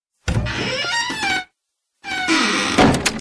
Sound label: Sound Door; Tardis